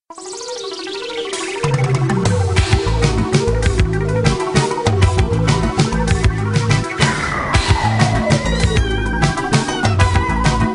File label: background music